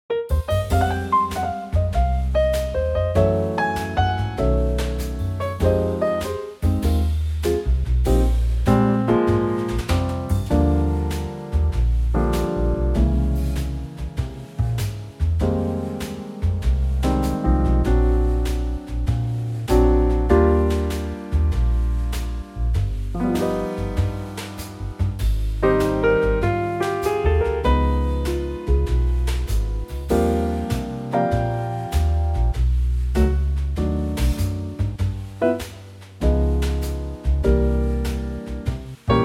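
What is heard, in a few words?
Eb trio, Backing Tracks for Male Vocals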